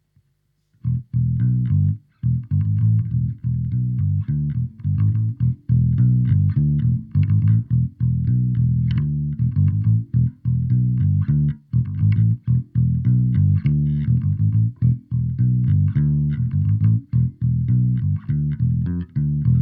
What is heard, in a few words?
Bass; lampes